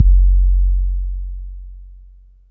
Bass Drop, 808; Street Anthems Sound Kit

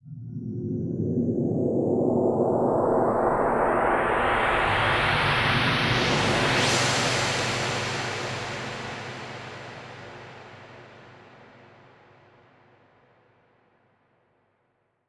SweepFx 140BPM; Sound FX; Vengeance Samples; Incast Kit; E minor

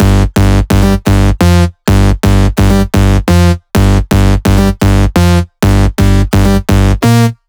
128BPM Melody; Vengeance Samples; VFH1 128BPM Heavy Duty Kit

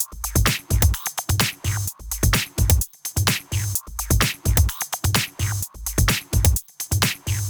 128BPM Kit; Vengeance Samples; VFH1 128BPM Technology Kit

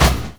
Tom, Percussion; Vengeance Samples

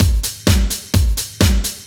Housebeats, 128BPM; Loops; Vengeance Samples

